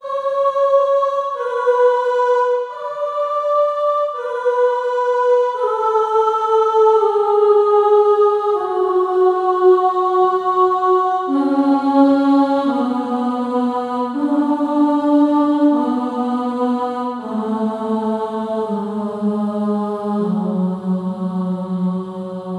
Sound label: castle tension layer; sky jac; windows gamerip 2016